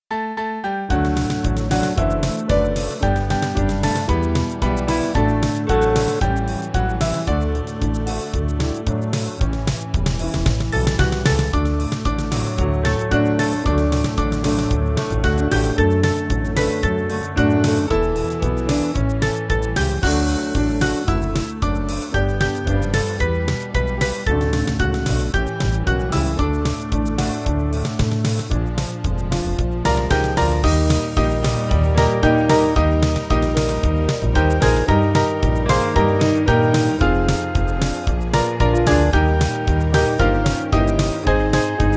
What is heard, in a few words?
Band